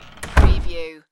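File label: Big Door Shut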